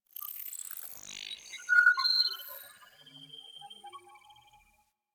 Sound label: Beep Short H; Ambience; Interior; Warframe; Orokin